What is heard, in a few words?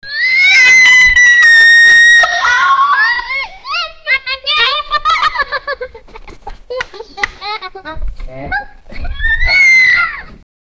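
two kids laughing and playing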